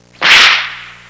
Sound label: whip; Sound Effects for Multimedia - Volume 1